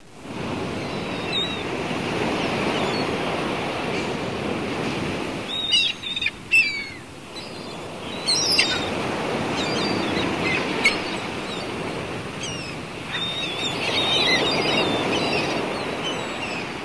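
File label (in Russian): Шум моря, чайки; cstrike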